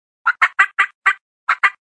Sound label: Cutting